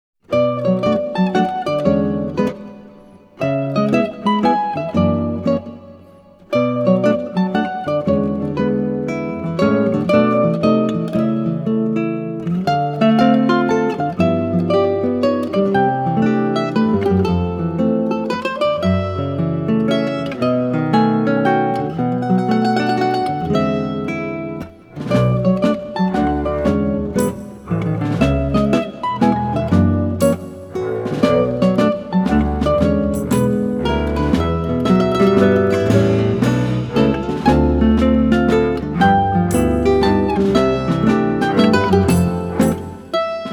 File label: no whistle; ARIA